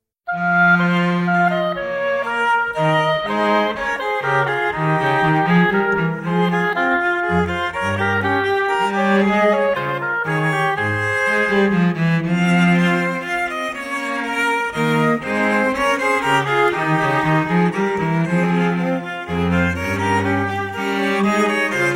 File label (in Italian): Menuetto In Fa Minore; Eppur Si Muove